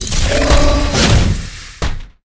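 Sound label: boss attack, monster; Bizen; steamer crazy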